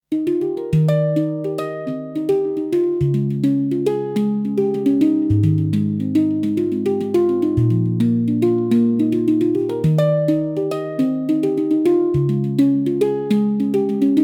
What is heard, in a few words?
handpan; handpan volume 10 spirit